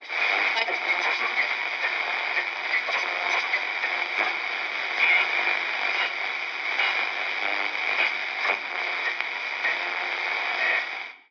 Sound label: Radio Music; Radio Music Bank